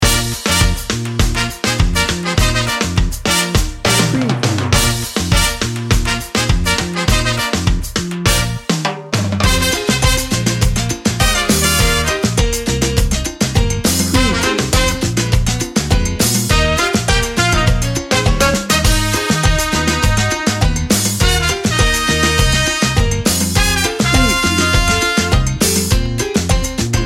salsa fire

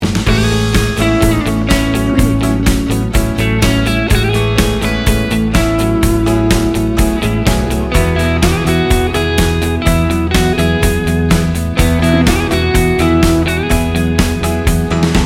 warm upbeat soft rock

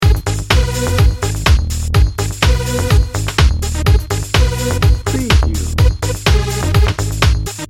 party beat loop

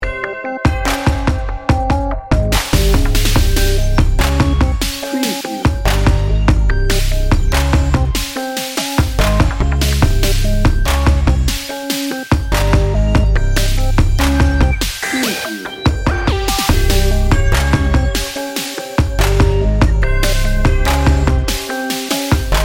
sinister dark hip hop

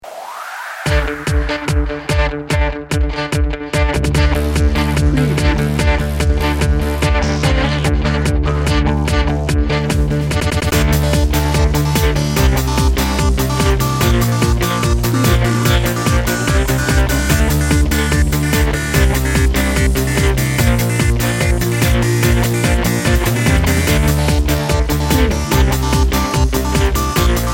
extreme action bed no lead

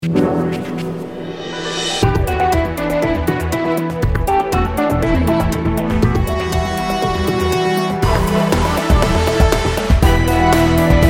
global adventure

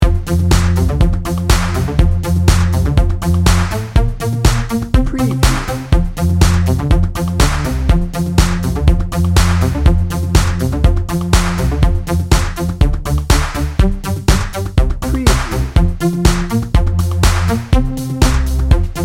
joy to the world

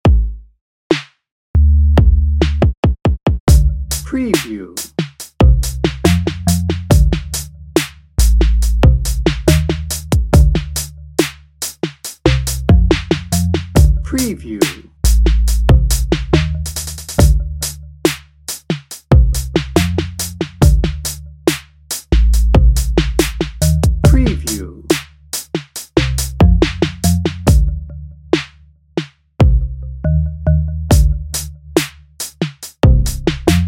urban culture dnb

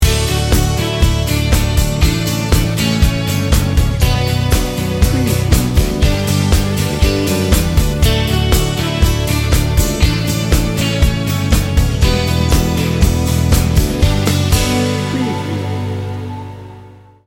mellow pop rock

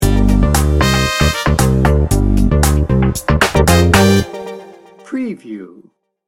sleek and confident sting bumper

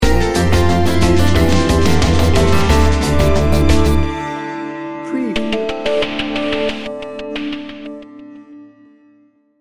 somber reflections sting bumper

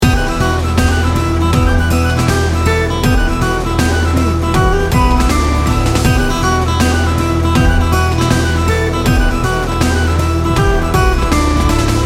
celtic hero loop

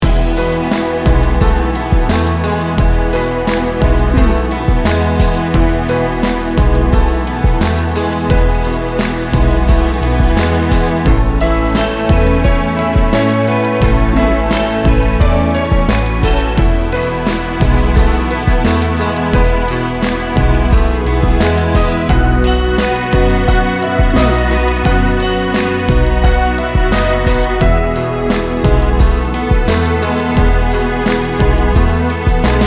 rain on the roof loop

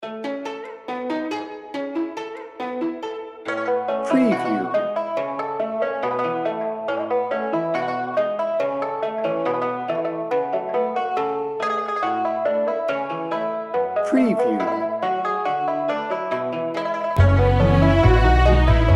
beijing no drums

